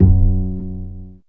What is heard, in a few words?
BAS TON, SOLID BAS; E-Lab; X Static Goldmine 2; akai